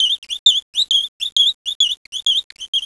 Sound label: bird